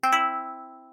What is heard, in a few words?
balloon Pickup D; balloons-and-static-electricity